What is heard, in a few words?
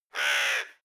crowing; natur tiere; voegel